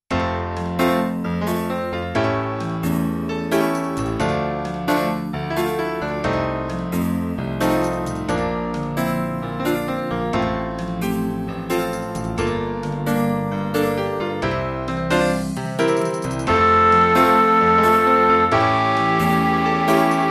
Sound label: rain down midi